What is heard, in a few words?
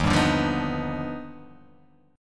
D sharp Augmented Major 9th D sharp, chord; strummed; x,6,5,7,5,6,5,x; JGuitar